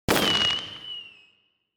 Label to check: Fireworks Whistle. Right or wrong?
Right